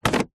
vehicles car gear stick automatic movement set gear toyota rav4